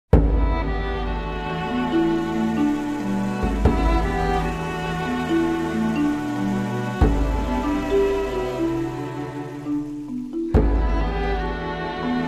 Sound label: String Quartet; Hoyle Card Games 2005